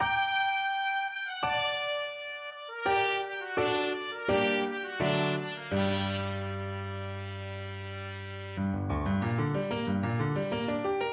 piano, trumpet; grieg

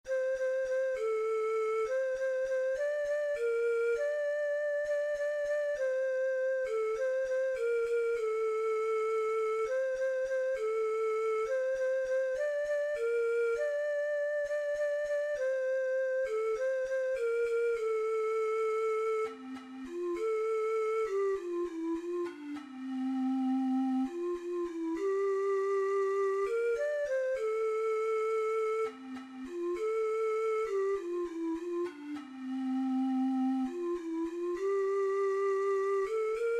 how great thou art, ocarina six hole

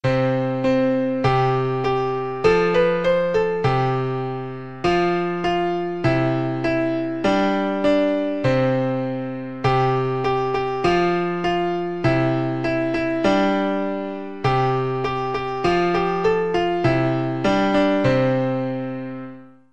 Baa Baa Black Sheep PNO, piano

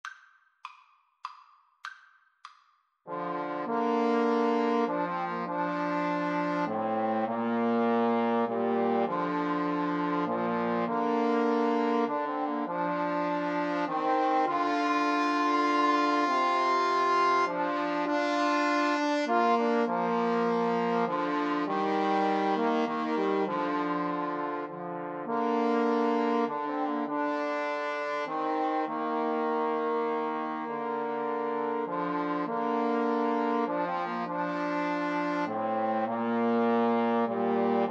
amazing grace, Trombone Trio

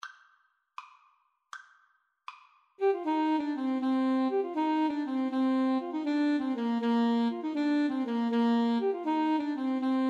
brian boru 2 A SAX

